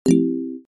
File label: use bomb